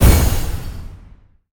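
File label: magic explosion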